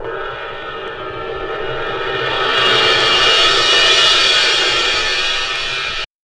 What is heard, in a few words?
Ride set, Drum and Bass